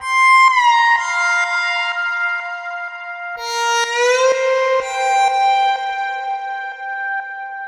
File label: E Viola; Сэмпл синтезатора (Minimal-Electro); 125 BPM; Synth; минимал